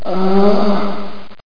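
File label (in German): Ächz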